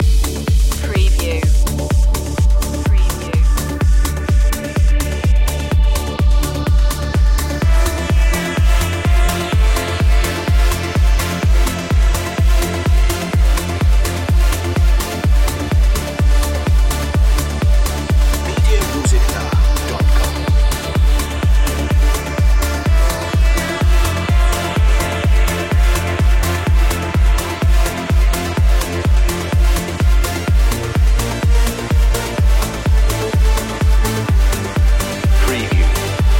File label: progressive skill loop